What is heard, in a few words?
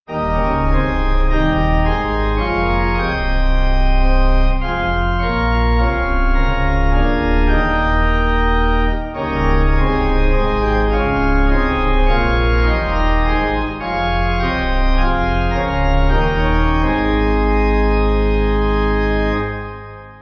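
Pipe Organ, Music Backing Files for Churches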